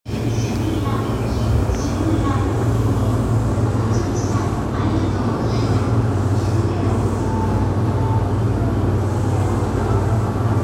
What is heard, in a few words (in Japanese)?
JR埼京線渋谷南, train sounds